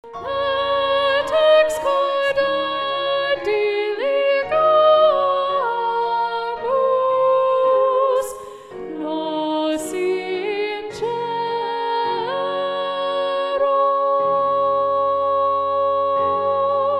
Ubi Caritas SSAA Soprano 1a Predominant

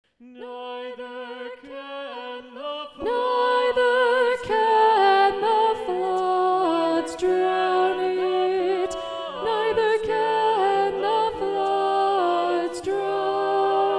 SATB Alto 2 Predominant

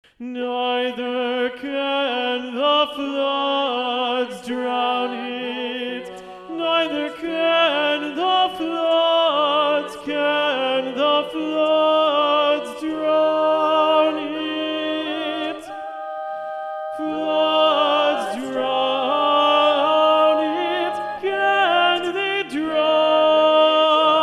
SATB Tenor 2 Predominant

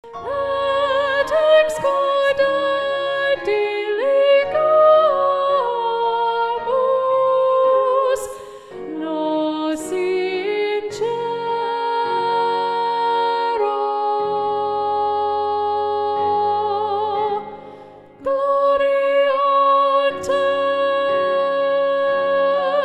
Ubi Caritas SSAA Soprano 2 Predominant

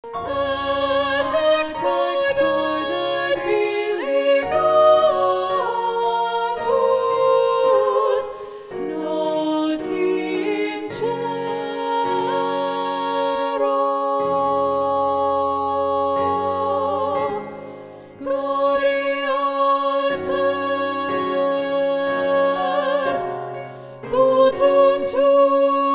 Ubi Caritas SSAA Alto 2a Muted

